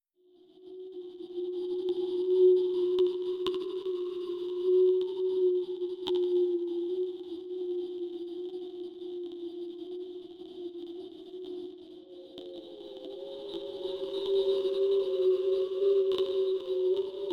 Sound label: Ambiant